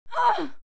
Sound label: female fight scream pain